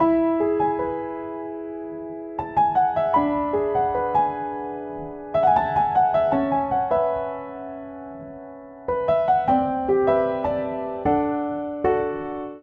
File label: Progression Nostalgic Descent I-I_3-vimin7-bvimaj7-bvii E Major 76 bpm, Upright Piano; MIDI Clips; Ableton; Factory Packs; Spitfire Audio